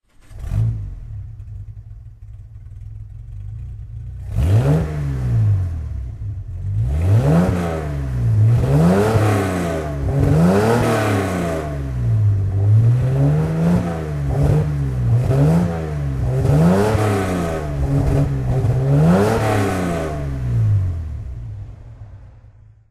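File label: jaguar C type throttle, Engine sound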